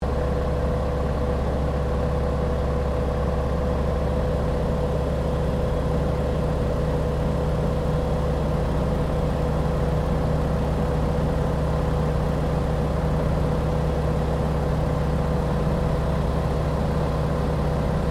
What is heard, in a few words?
Yakovlev Yak-52 flying interior, Russian Yak 52 Training aircraft; Airplanes; Vehicles